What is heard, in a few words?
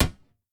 sling metal, attack impact